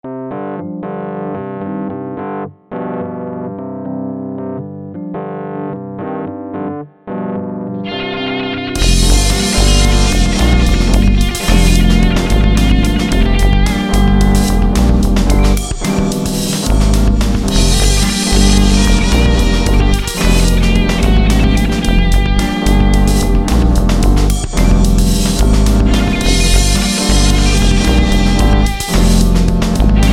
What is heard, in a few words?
Guitar Keys